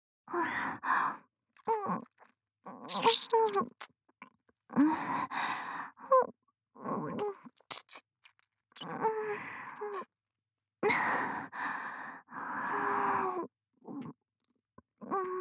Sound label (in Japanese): 耳舐めループ仕様